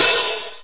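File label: Linn open hi hat; drum machine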